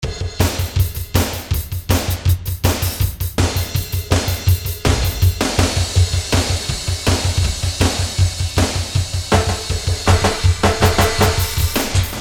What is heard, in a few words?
Metal Drums, E-mu Pro-Cussion; Factory ROM kits